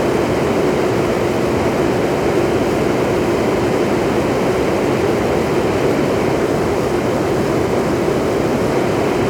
Aircrafts Plane Fur Wind In R; DCS

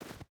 footstep barefoot snow run; Rust

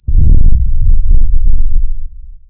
cannons sub

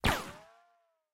bullet ricochet

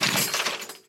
12mm dshk mech, guns tanks

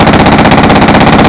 Inf Weapons; Red Orchestra 41-45

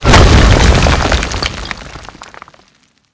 Projectile Sounds; Red Orchestra 41-45

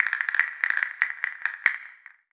castanets; ambient; World of Tanks Blitz; pliego

